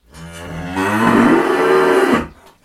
COW SOUND

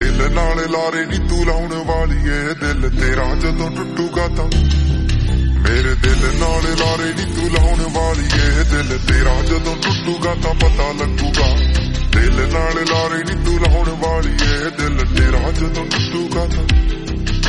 slowed reverb ringtone

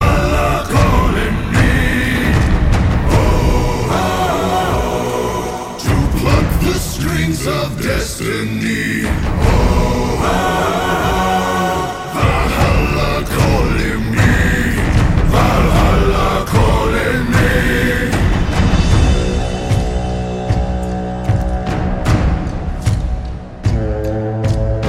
drum ringtone

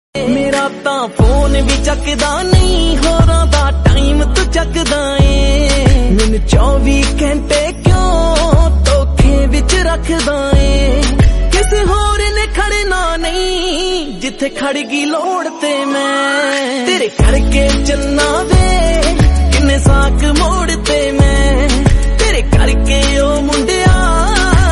NEW PUNJABI SONG

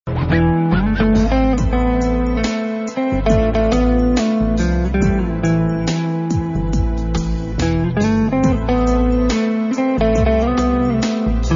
GUITAR REMIX RINGTONE

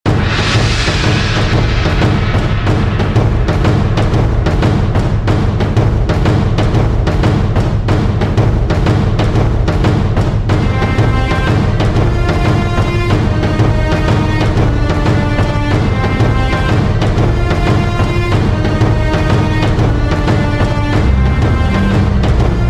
drum ringtone

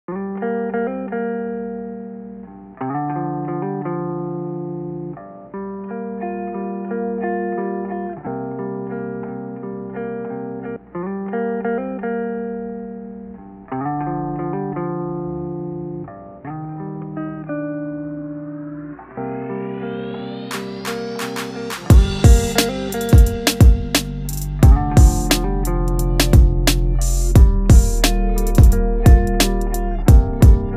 GUITAR HIP HOP INSTRUMENTAL BEAT

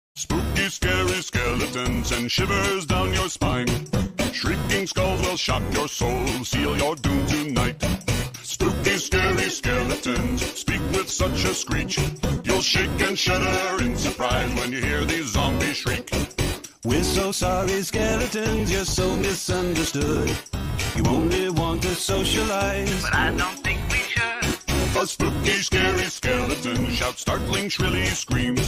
spooky scary Halloween ringtone

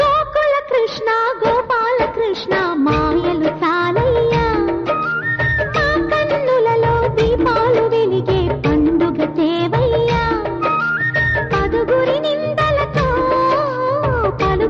SONG RINGTONE

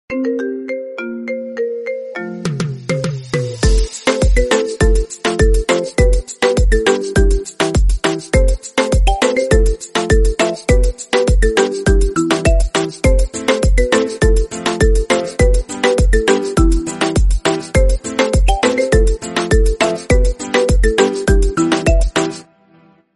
iPhone marimba remix ringtone

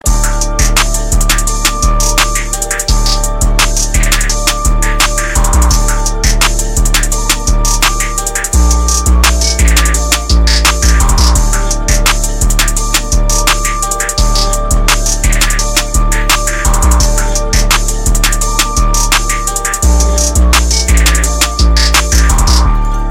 INSTRUMENTAL RINGTONE

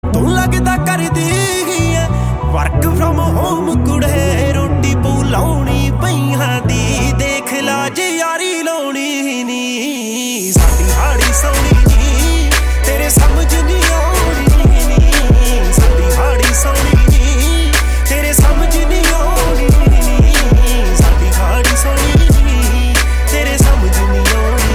PUNJABI SONG RINGTONE

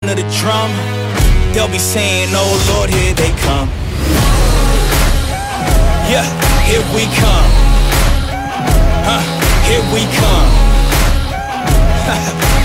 drum ringtone

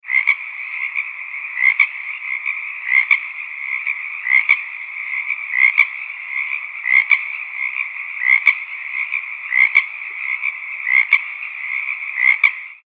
FROG NOISE SOUND

